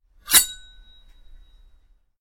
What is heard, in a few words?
Knife Unsheathing